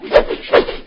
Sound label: jump, poisoncrab; svencoop; hl2